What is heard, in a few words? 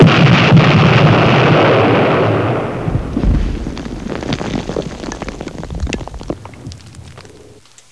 ex big; svencoop